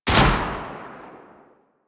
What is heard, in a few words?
Rifle Shot Alt A; Sundial; Warframe; Tenno